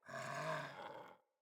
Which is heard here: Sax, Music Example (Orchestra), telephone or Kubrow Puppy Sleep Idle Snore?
Kubrow Puppy Sleep Idle Snore